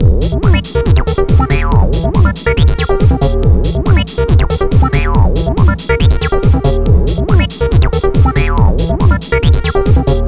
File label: Old Skol Acid Trance; 100 acid vol 1